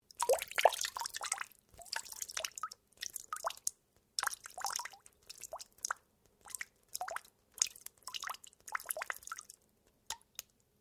Water Trickle Medium, Various water sounds recorded in a domestic pool; Nature